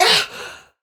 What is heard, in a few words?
Pain; Warframe; Tau; Dax; Ninja